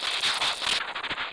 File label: schrcht; MOBICLIC 13